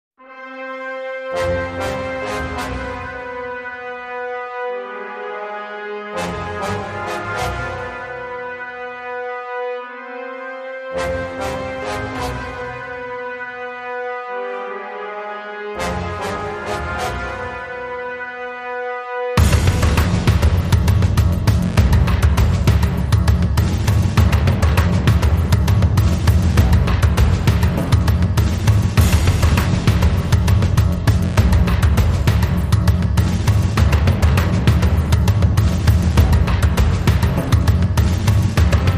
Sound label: Thunder and Drizzle, Rhythm